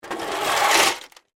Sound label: Stones Shovel, Industry, Stones, Shovel, Scoop; Yard Tools (Sound Effects:Tools); BlastWave